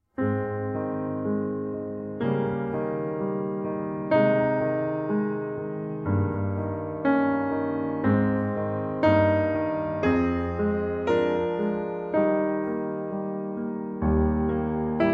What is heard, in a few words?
Piano Sonata No. 8 in C Minor, Adagio Cantabile; Op. 13 Pathétique